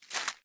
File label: Footstep gravel